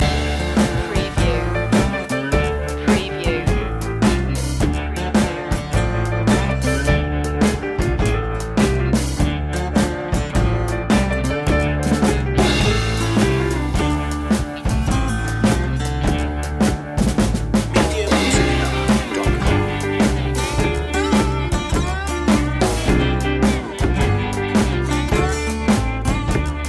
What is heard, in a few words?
blues loop